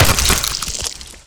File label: sniper gib; weapons; bullets